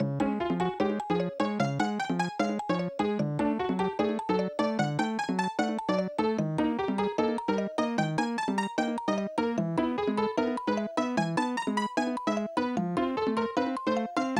Toad House Minigame (Balloon); super mario maker 2; Super World

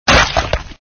SFX sound (fish hit); Red Baron

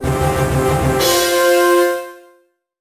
Battle Success; the chronicles of narnia prince caspian ds 2008

